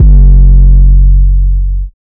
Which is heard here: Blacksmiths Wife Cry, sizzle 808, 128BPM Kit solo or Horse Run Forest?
sizzle 808